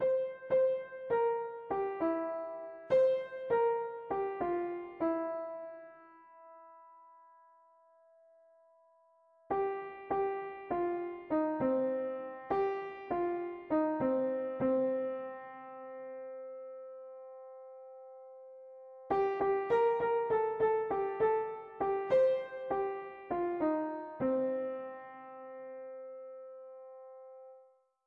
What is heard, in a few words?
blues